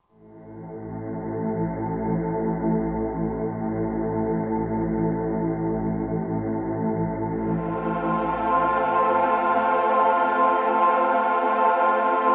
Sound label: Slow Choir; '60s & '70s; Roland SR-JV80-08